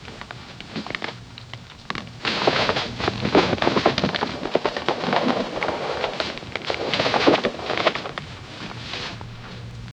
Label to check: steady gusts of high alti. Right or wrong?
Right